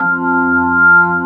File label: B3 HAMM F2, organ; AKAI S-Series CD-ROM Sound Library VOL-2